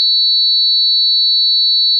正弦波（定常）2本, 仮想ピッチ; 音の高さ

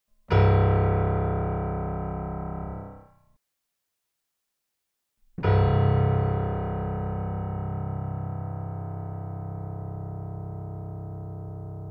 C1, 打弦; 弦鳴楽器

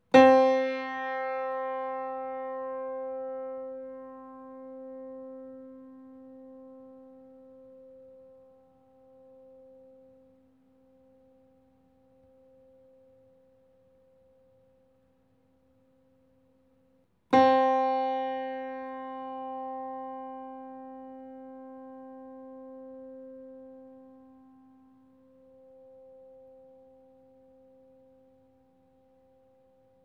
C4, 打弦; 弦鳴楽器

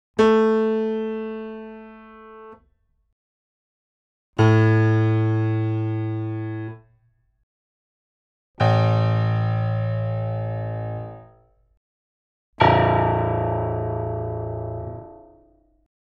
Pf A3, 打弦; A3～A0; 弦鳴楽器

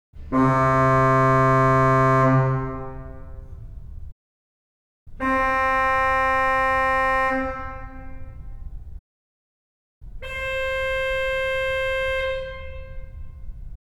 Organ レジスタ; 気鳴楽器; レジスター３（リードパイプ）

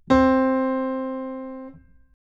Pf, 打弦; C4; 弦鳴楽器

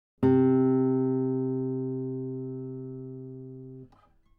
C3 on A 指弾き, 撥弦; 弦鳴楽器; アルアイレ

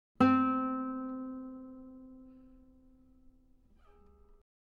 撥弦; 弦鳴楽器; 河野賢; C4

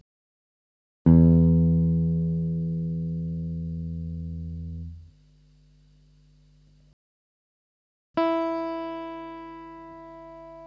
エレキ・ギター; 電鳴楽器; ３機種比較

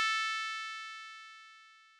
調波構造音（10～30本） 高域, 仮想ピッチ; 音の高さ; 高域下がり・時間減衰波

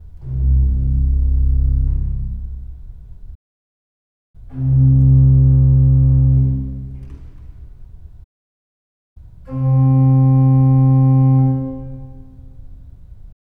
Organ 足鍵盤 C; 気鳴楽器; 足鍵盤C1C2C3

